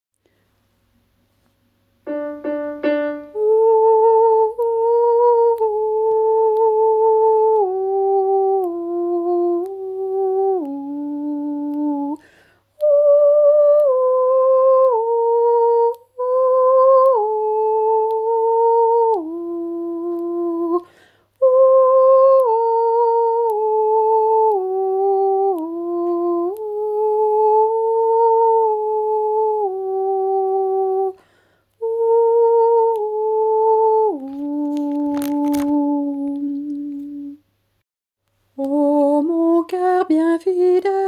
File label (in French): Le Sorbier de l'oral Hommes